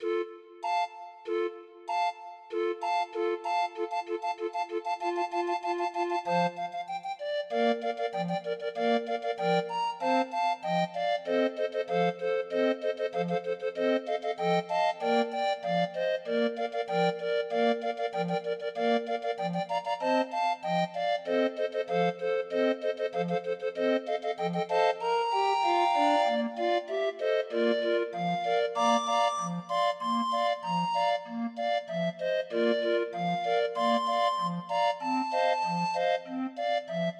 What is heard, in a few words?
grind organ